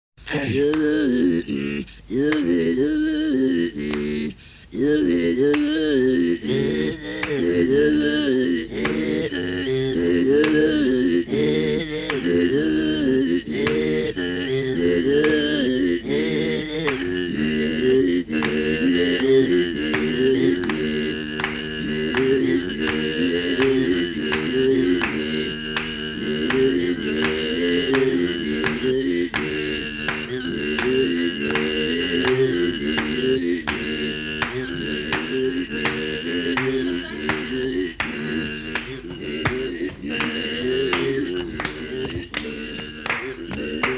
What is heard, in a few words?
South Africa Duet With ordinary Umngqokol